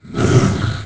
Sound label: Hit; The Elder Scrolls III Morrowind GOTY; XBOX; Dagoth